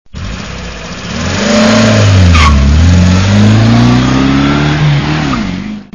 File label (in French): auto demarrage voiture